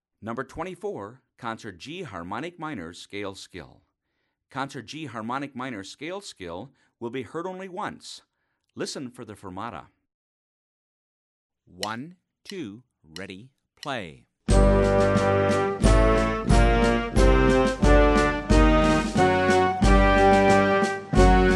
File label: concert g natural harmonic minor